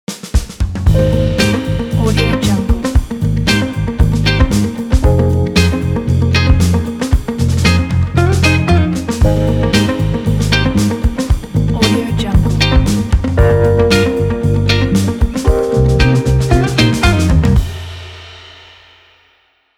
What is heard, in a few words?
folk rock intro logo